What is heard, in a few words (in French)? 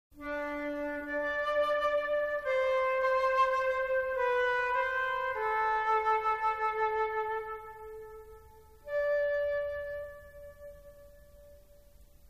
Flûte